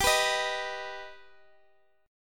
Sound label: D sharp Augmented G, Guitar